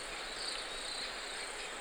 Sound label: Atmos; The Ghost Cave